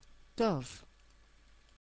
dove

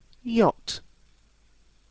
yacht, transport

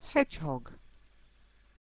hedgehog

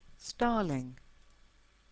starling

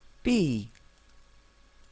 bee

